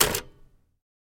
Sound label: Typewriter Back Spa; typewriters